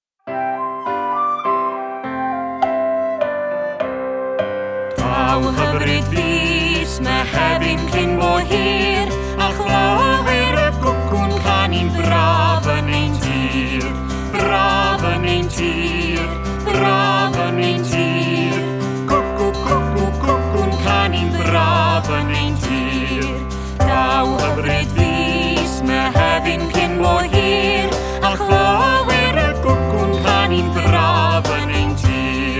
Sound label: Daw Hyfryd Fis Singing